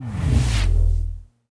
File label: force weak